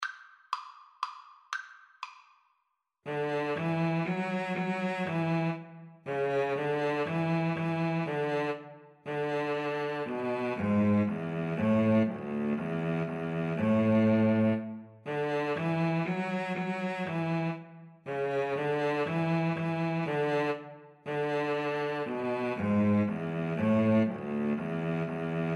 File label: minuet violin cello duet